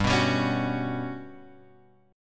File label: G Major 7th Flat 5th; GM7b5 Chord {3 2 X 0 2 2} strummed